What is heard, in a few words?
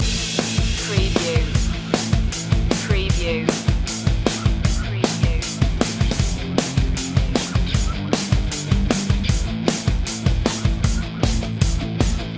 crash course loop